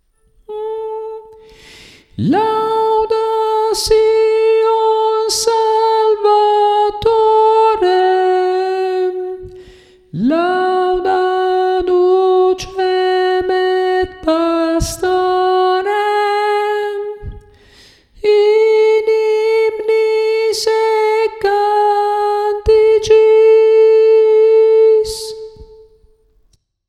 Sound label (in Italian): soprani